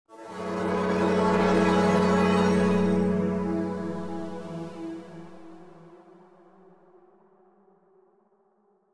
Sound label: Electric wave